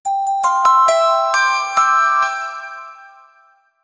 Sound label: christmas theme alert sou